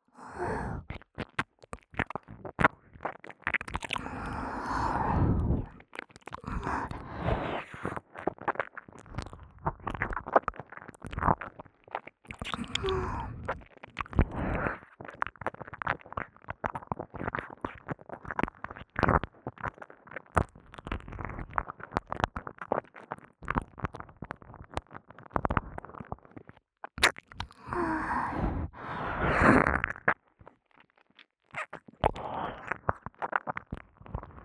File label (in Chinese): 舔耳 较多汁液; 仅仅是沉溺于舔舐声中; 舔舐声特化